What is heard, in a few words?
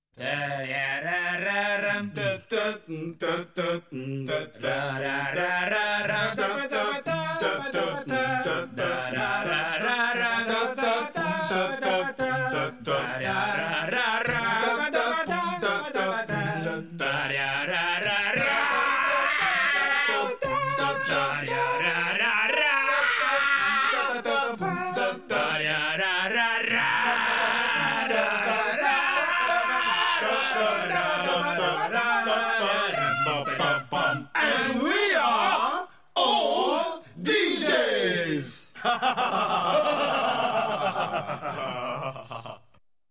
Jingle